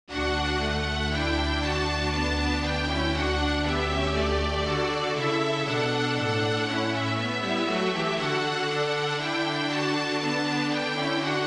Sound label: Ode To Joy, string quartet